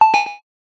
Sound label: retro game hit arcade 80s simple alert notification classic; epic stock media